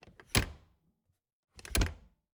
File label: Refridgerator, old fashioned, wooden, steel, brass clasps, hinges, open close, quick; Eiravaein Works; Vaeyan IV